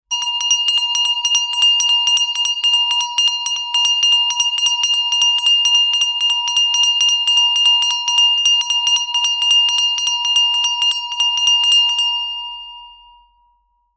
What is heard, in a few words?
bells med handbell bell ring long